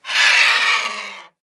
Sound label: vulture